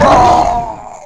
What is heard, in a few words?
death cry; Battle